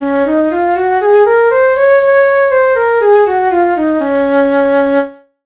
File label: C major Scale; テンポはそのままにピッチ調整; Pitch Switch